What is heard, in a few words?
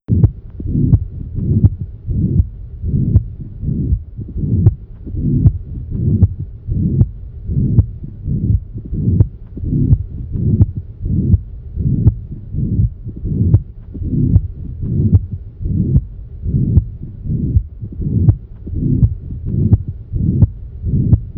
vsd, cardiac; pediatric; pediatric-ai-scribe